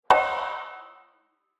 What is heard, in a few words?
Treated Metal Hit; Metal Sound Effects; Impact